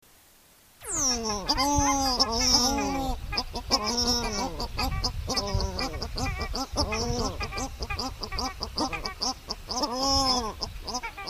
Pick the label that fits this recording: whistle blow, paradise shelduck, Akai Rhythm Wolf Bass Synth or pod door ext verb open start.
paradise shelduck